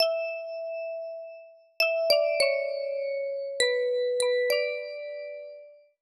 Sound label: Music box; Sprunki Incredibox